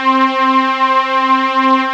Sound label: RICH WAVE